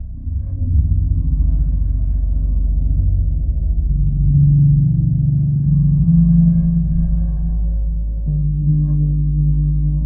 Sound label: dig deep atmosphere; LOOPING AMBIENCE